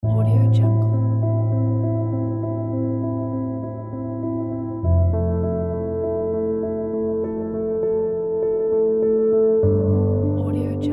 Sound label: sadness and suspense